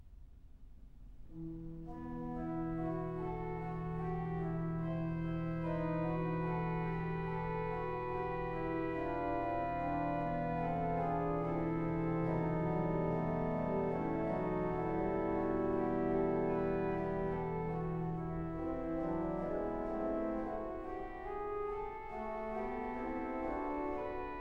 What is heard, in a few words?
Verset, For Organ Harmonium; Keyboard; Saint-Saëns, Camille (1835-1921); 2 Pièces Brèves